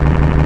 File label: Elec beam; Big bang